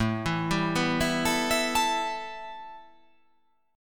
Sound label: A 7th Suspended 2nd